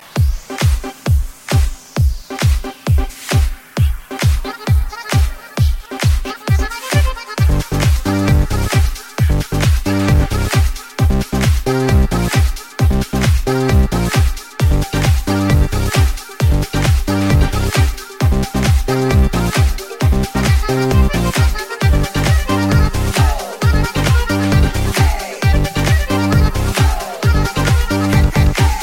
Mashallah Remix Beat

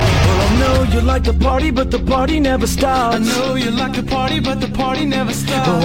Party Song

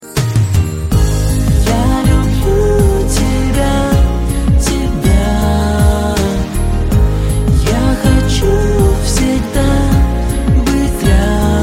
Iloveyou Ringtone

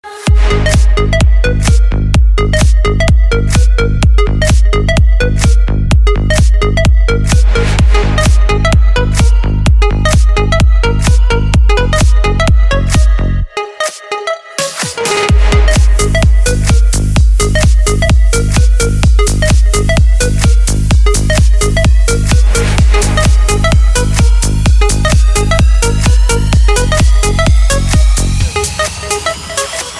MINIMAL TECHNO

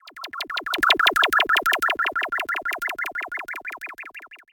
Spacey electronic

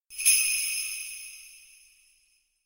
Bell

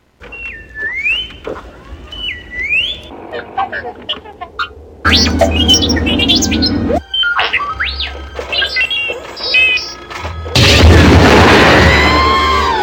Robot Speech; R2

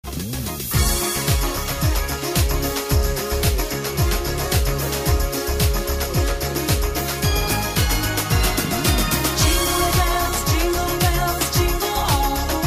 Jingle Bells

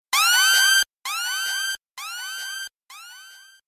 808 Siren

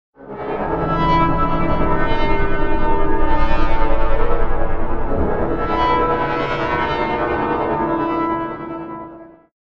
Horror

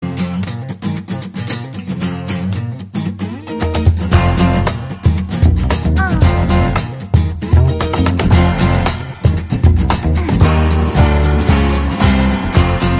Guitar

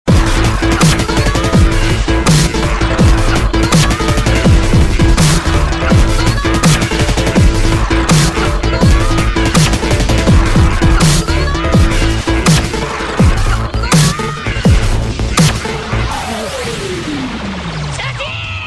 touching music

